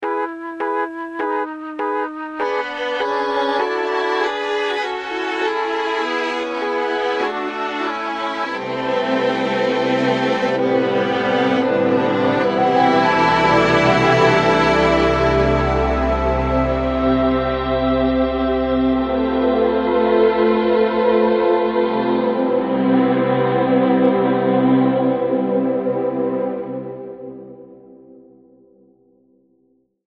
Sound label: Mellotron; Sonic Implants